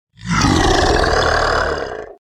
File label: Dinosaur - Monster Opens Mouth; Hollywood Edge; sound designer's toolkit v2